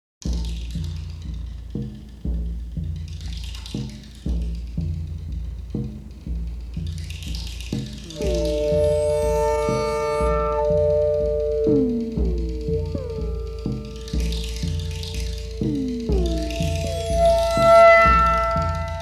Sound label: MECHANICAL ORGAN, Electronic Dance Music